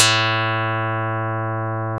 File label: karplus